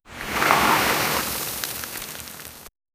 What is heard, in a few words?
sand flowing slowly then; deserts